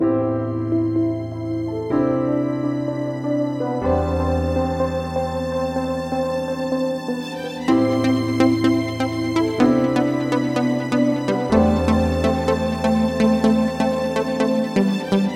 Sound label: RING TONE